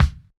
rich mid range bass drum one shot c sharp key, Royality free kick drum single hit